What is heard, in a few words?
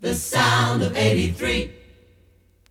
jinglepackage; topformat LP jinglepackage 2